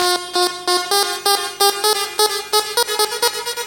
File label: Synth - 6A, Jungle; Riffs, Arps & Hits; Blu Mar Ten - Jungle Jungle - 1989 to 1999 Samplepack